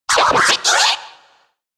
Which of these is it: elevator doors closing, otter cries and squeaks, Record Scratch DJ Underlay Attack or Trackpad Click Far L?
Record Scratch DJ Underlay Attack